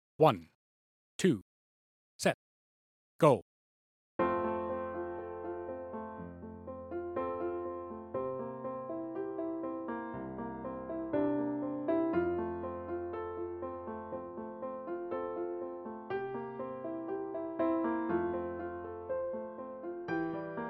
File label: Tempo 70 bpm; F Strauss; tmea solos